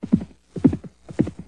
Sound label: sfx HORSE GAL